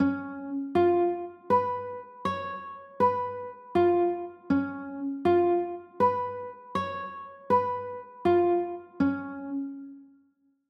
d flat 7

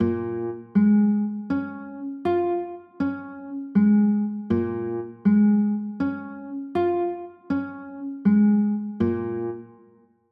b flat m, chords; b flat m7

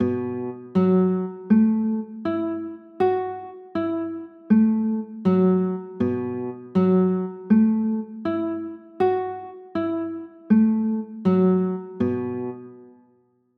b 7sus4, chords

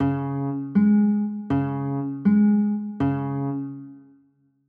c sharp 6 9, chords; c sharp 5

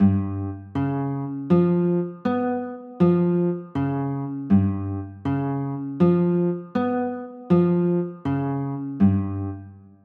g 7b5, chords

